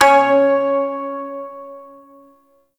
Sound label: Shawzin Oct Two C Sharp; Warframe